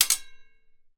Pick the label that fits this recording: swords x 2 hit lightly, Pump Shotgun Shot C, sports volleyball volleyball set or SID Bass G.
swords x 2 hit lightly